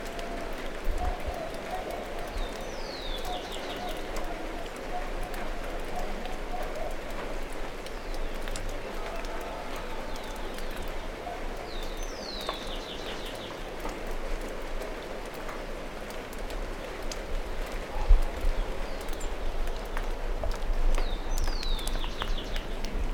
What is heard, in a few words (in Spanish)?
lluvia en Ñangalí, Piura